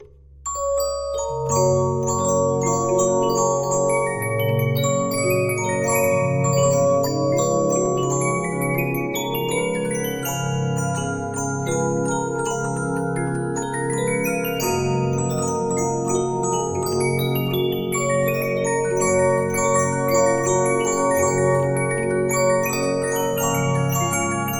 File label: Regina, 15inch disc musicbox